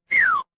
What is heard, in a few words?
cartoon slide whistle